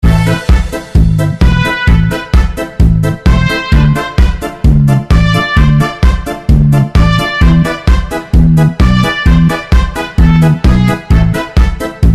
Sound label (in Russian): Banditskaya muzyka bassboosted gain 30dB; Stalker; Stalker clear sky